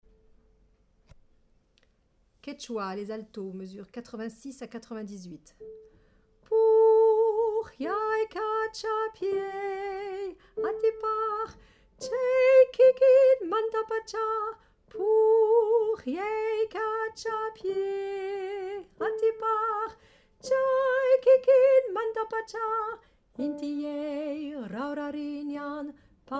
Alto; Quechua

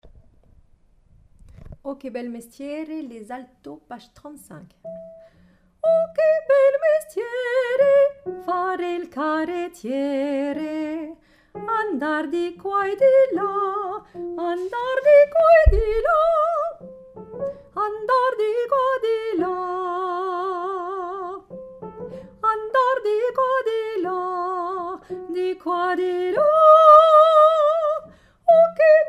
Alto

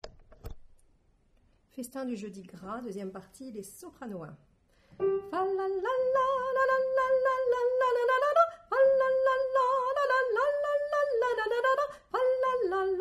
Soprano

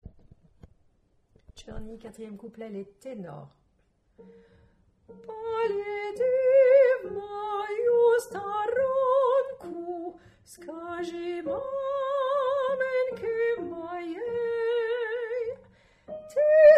Tenor

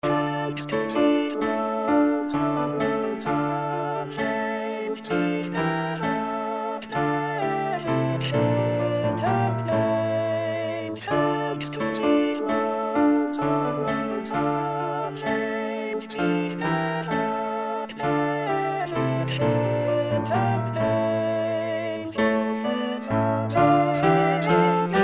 thanks to these lonesome vales Alto

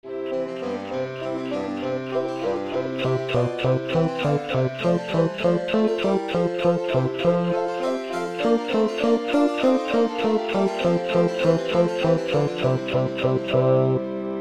hohoho Basse; Purcell - Didon & Enée

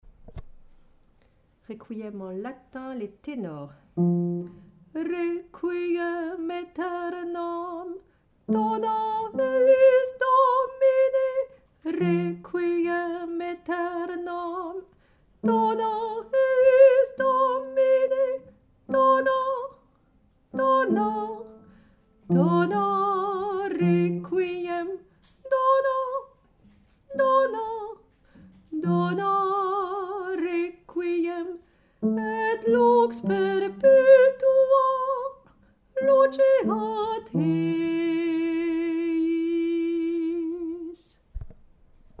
Tenor; ouolof; So Vocal

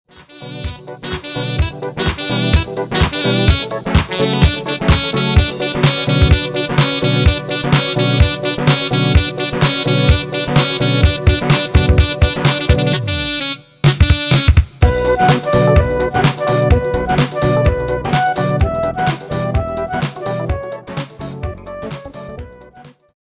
midi sequences

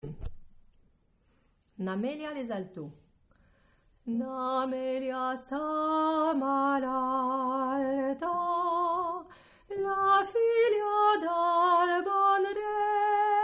Alto; catalan

